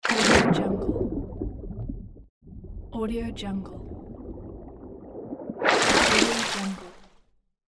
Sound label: water submerge and emerge